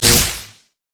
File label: lightning rush; LoL; kennen